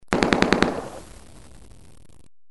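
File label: MACHINE GUN; army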